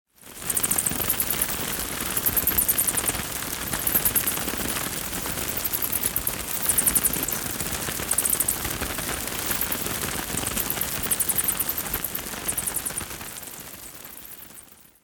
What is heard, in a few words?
Bat cave bats flying around